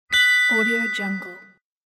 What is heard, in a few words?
ding